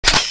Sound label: pistol clip in; sfpistol; weapons